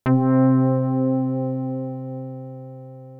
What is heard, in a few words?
SYNTH Simple FM